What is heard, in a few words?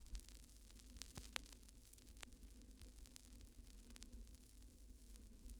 Vinyl Crackles